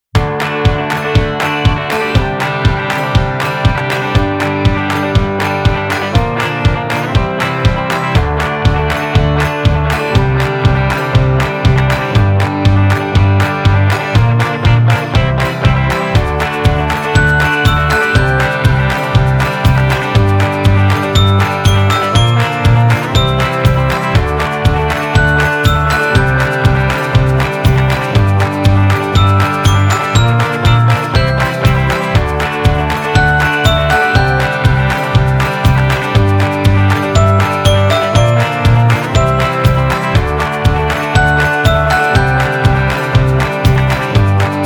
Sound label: Indie Folk